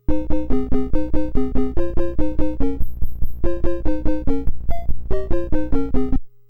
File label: 2-Voice Music